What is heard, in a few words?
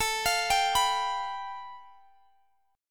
A 7th Suspended 2nd Sharp 5th A